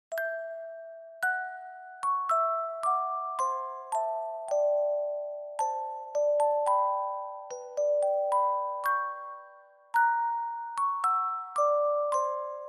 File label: Reverb Fast; bgm; Wedding March; Mendelssohn